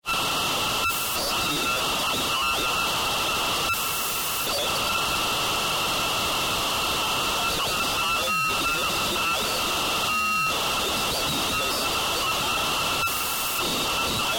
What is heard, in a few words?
Science Fiction Communications Distorted Radio Signal